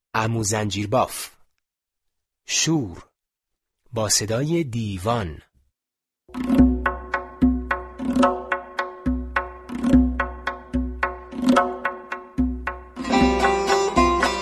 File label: Happy children's song